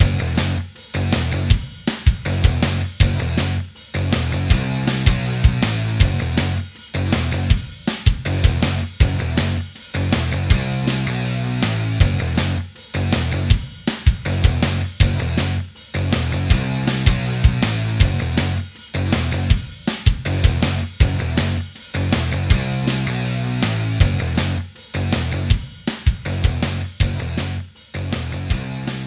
3d Music

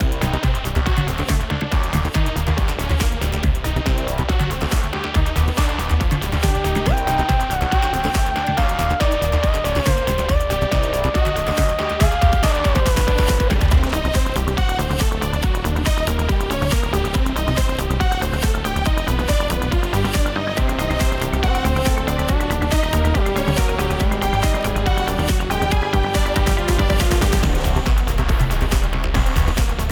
Battle bpm140; Zombie Rollerz; Pinball Heroes

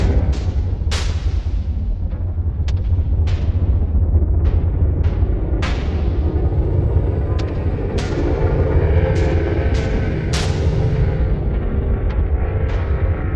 amb monster test

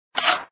draw weapon; Raft Wars

